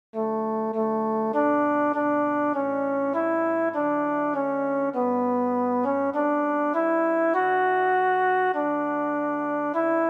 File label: alto